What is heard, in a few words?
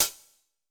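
hat closed; Greytsounds; Scott Peers Sound Engineering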